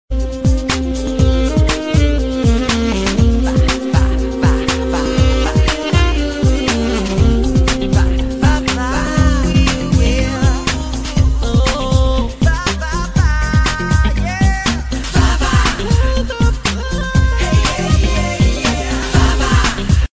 beats ringtone

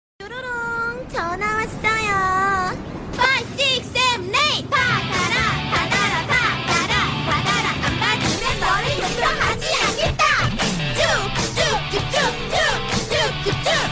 love rain tone ringtone; love rain hana tone

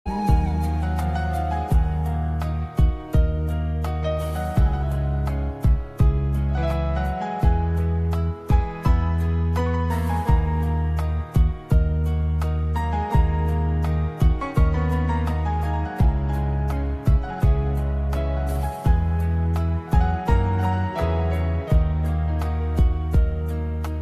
piano ringtone

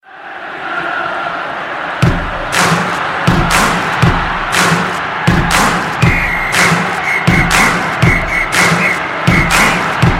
football chant ringtone

